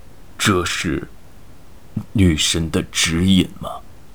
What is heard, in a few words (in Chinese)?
死亡, BattleCry 大胡子; ycrpg